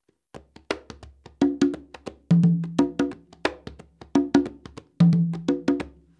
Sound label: rumba swing, congas